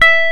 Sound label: Electric Pianos; OMI - Universe of Sounds; EII Factory Library